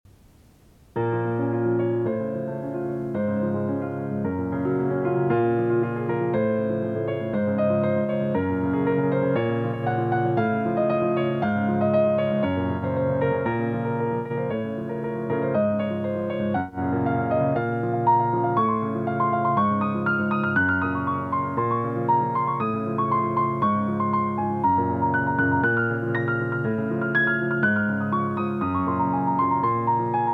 micropiano

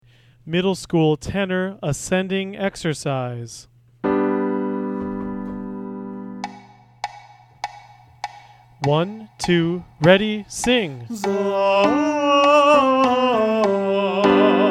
Vocalise Tenor

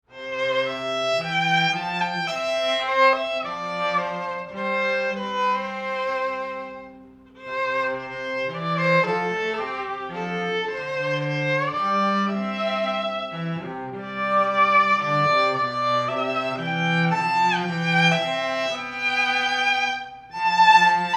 Vln Cello Duo; Violin & Cello Duo; Be Thou My Vision

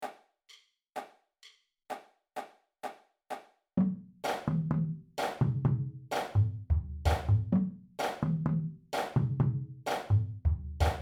5 Tenors

